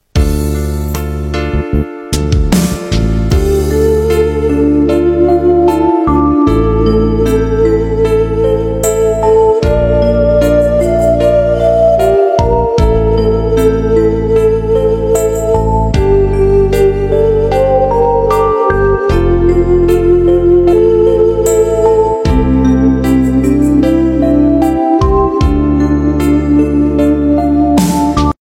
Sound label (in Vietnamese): không lời; tay du ky 1986